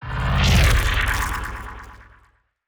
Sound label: pro ship harbinger move; biogenesis